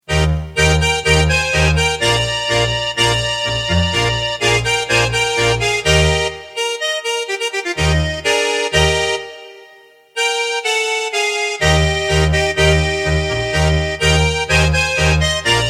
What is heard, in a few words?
Tango